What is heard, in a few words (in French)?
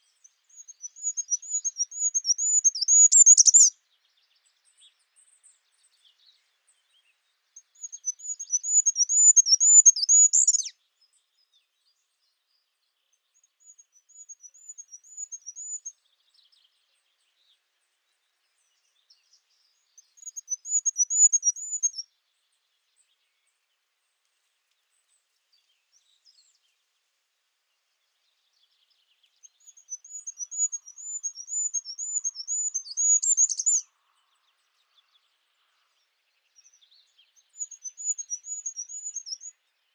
Roitelet huppe Regulus regulus Chant typique